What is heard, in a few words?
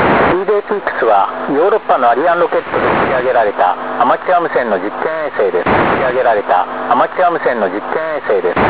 idefix rus; Sonidos del IDEFIX; aleman